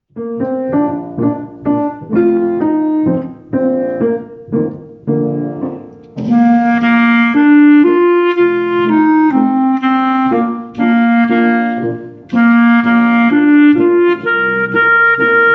with Solo

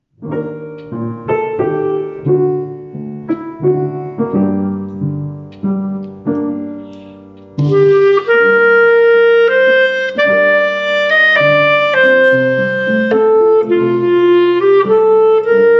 Greensleeves with Solo

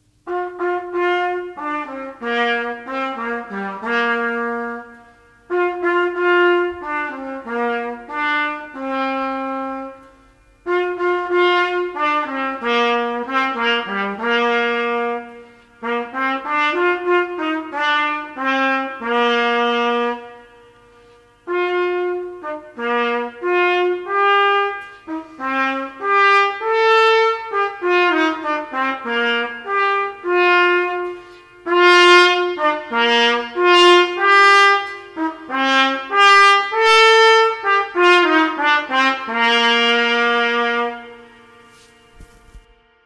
Give Me Joy Trumpet

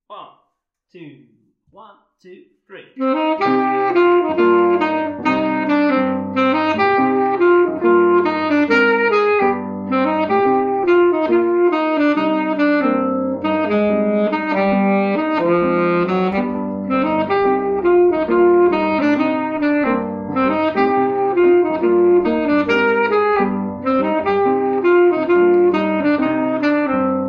Sax

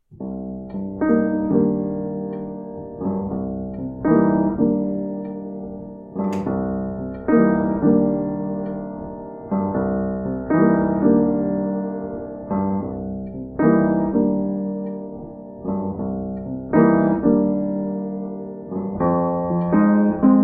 Sax, piano

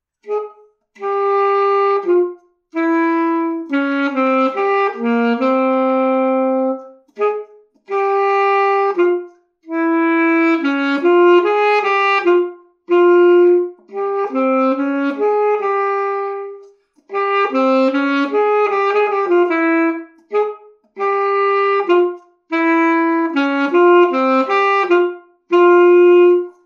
Sax Swing

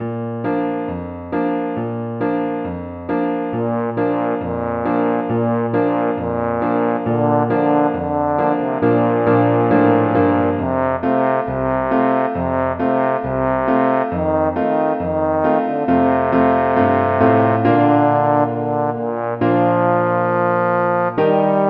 Solo; Robin Hood

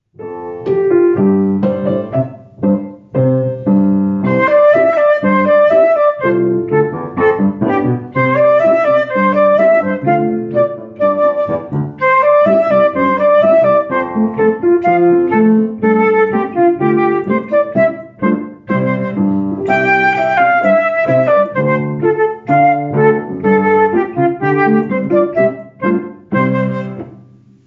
with Solo

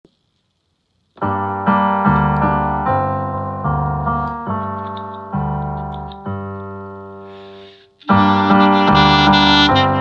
Clarinet The Birch Tree with Solo

